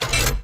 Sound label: hatch; sarge